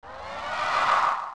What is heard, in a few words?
Wallmaster Away; OOT